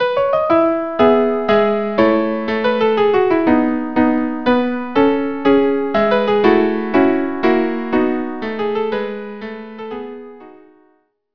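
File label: Minuet violin violin; Schubert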